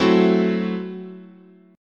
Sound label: F sharp Minor 7th Flat 5th, guitar chord